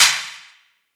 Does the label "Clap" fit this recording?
Yes